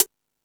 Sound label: Jazz Drums, E-MU Pro-Cussion; drum machine